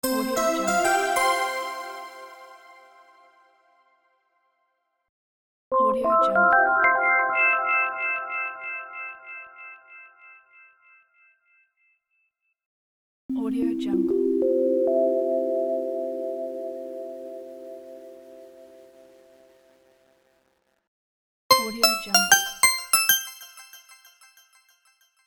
achieved

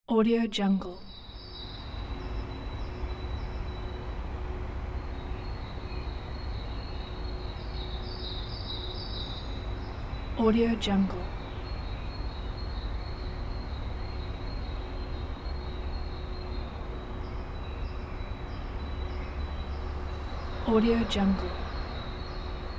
city morning

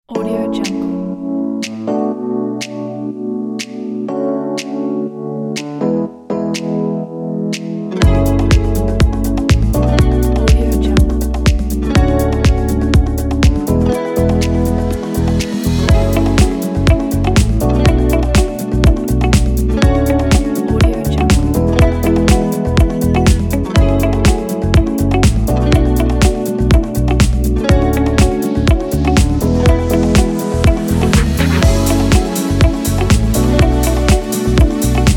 fashion show

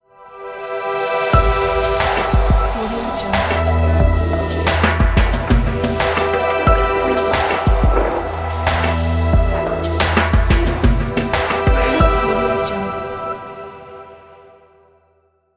logo lounge

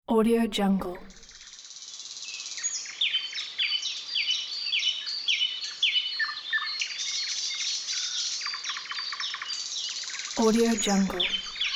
birds chirping

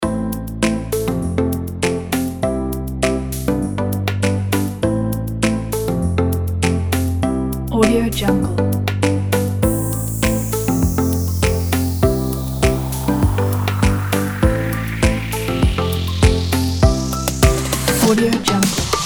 tropical house; ezloops

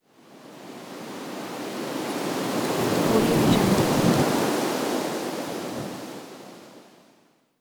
whoosh wind

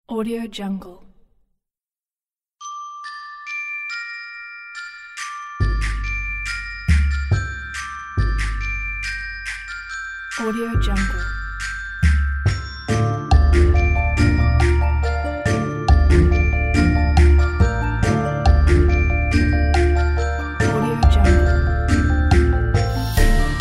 magic forest

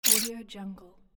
small monster attack

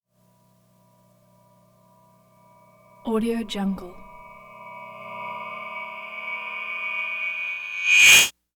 cymbal sting; prosoundfx

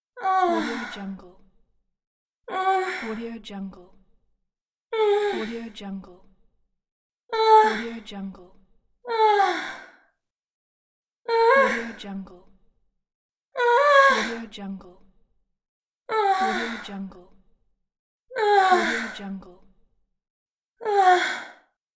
orgasm moan

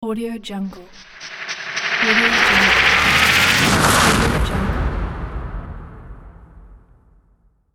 engine fast transform; gkrieger

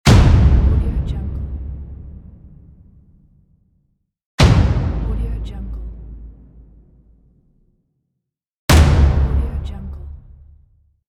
boom

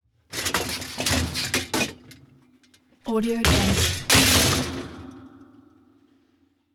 metal smash

